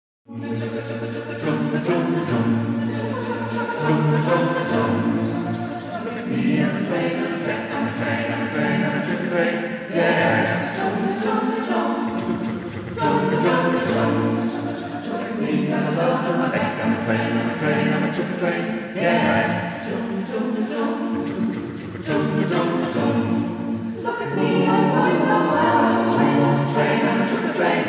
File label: Tutti